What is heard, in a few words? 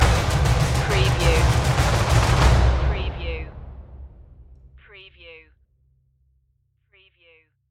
perc stinger